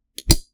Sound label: Switch